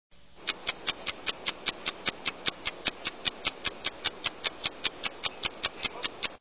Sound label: ticking clock; household